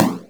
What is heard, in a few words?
BOOM, Electro Perc; E-MU Formula 4000 Series Vol. 3; Analog Odyssey